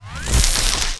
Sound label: shocklance reload, weapons; Tribes2